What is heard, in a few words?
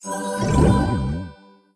Giant Bear Appears; candy crush jelly saga gamerip